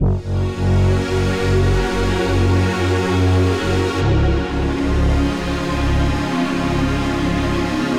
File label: Moon Pad Split, Synth; Ableton; Factory Packs; The Forge by Hecq